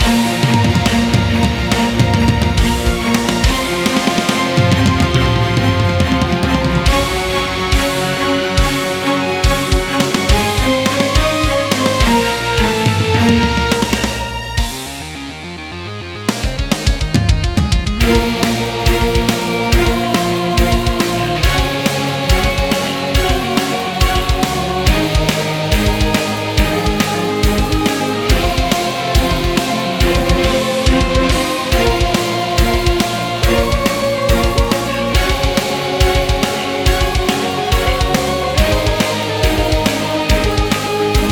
TENSION; ChocQueHangRao

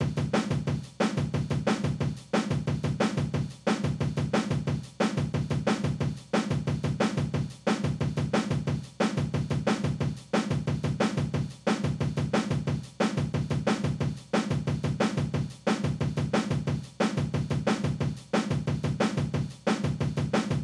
Breakbeat B 8 Bars; Vostok Inc.

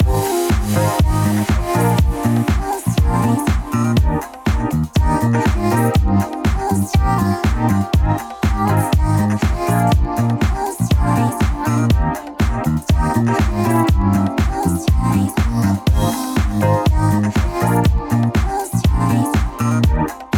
Funky Disk Loop; Popslinger

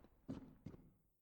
power out step

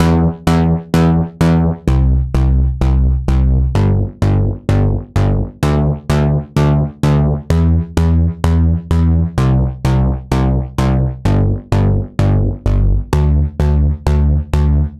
Poly bass; super crush k.o.

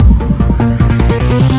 game music normal to fast

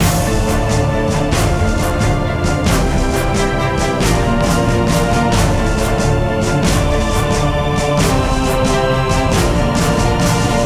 loop music skillshot; Hercules

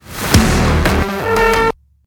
transition; SkullHacker transition05

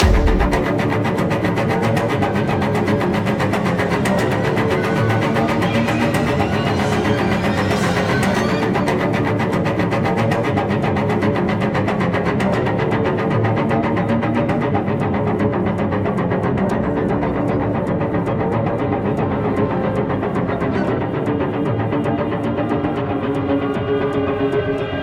Combat

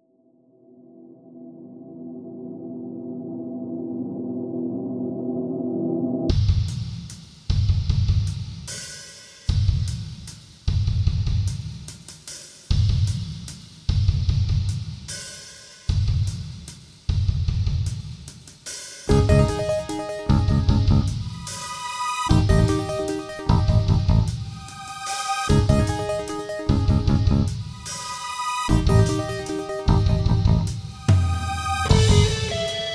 Creepy; Creepy Stream; Gunstream Original Soundtrack

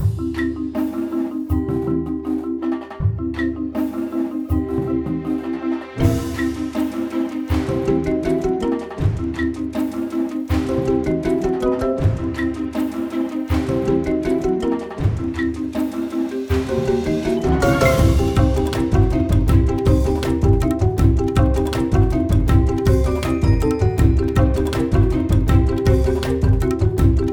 Battle Loop Regular

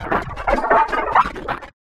glitch; Mini Games; Five Nights at Ronald's

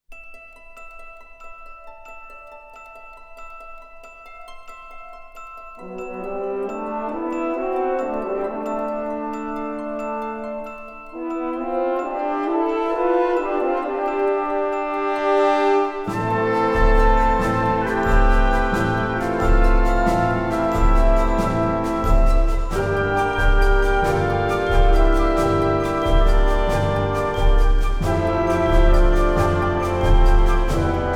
Tension; Ni no Kuni Shikkoku no Madoushi

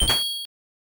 Coin; Dakkochan House; System 2

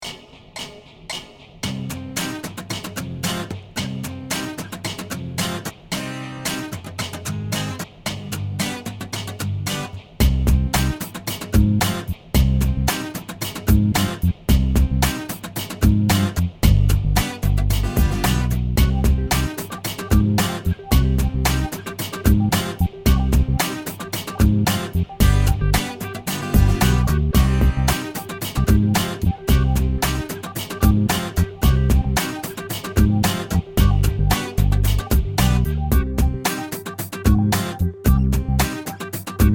Cold Funk; Ocean City Racing

